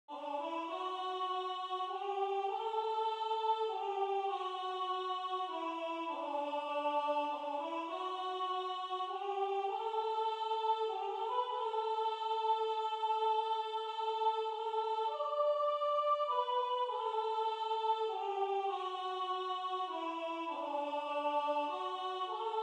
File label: Soprano